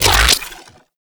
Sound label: Shotgun Beam Retract; Weapons; Warframe; Tenno; Revenant Shotgun